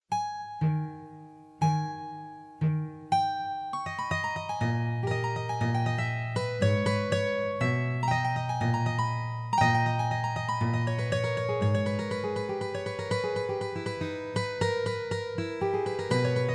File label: Guitar